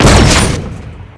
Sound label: flak cannon fire